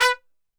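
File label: B2 trumpet shot, Brass Super Section; Best Service; TRP SHOT; 90 s Sample CDs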